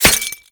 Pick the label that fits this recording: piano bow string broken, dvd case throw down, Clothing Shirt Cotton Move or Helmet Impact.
Helmet Impact